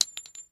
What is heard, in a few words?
item metal tiny drop